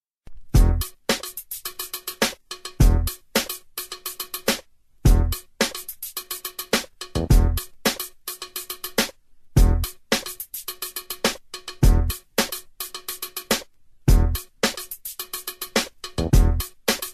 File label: cool loop